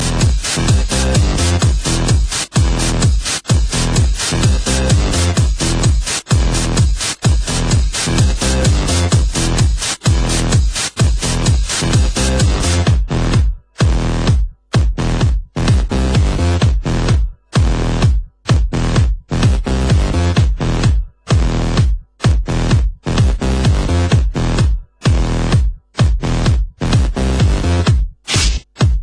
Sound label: Best Bass